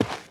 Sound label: Land A Jump